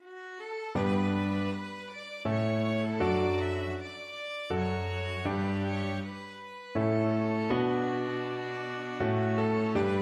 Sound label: jupiter, piano quartet; holst